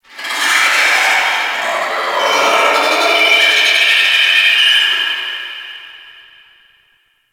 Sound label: Ice Critter Distant B, Ambience; Warframe; Corpus Ice Planet